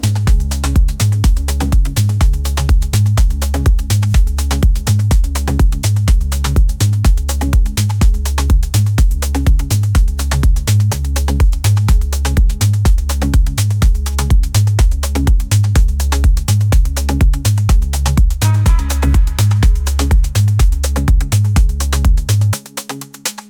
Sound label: Tribal Waves